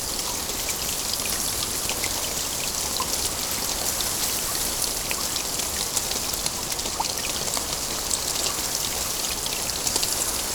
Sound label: Wind Tree Sad; Ambience; Props; Warframe; Duviri